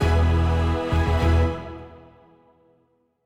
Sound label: Sting Brief positive; bakugan champions of vestroia 2020; switch gamerip